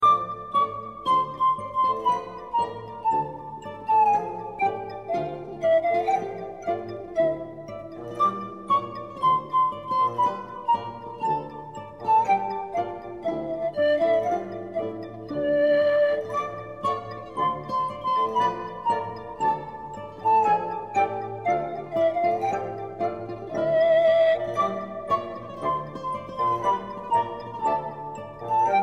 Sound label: Nice Flute